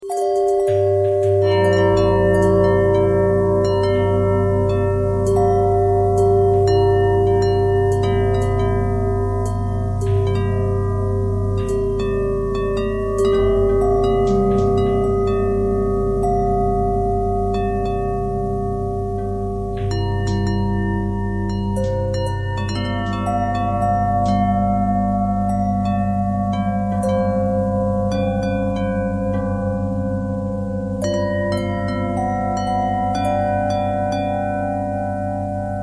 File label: bell bendtwist